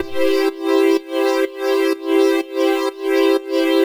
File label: Pulsation